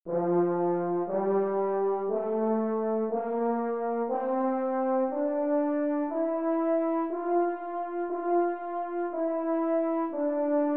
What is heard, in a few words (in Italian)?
Corno